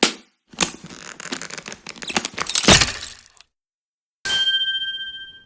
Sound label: transform; newman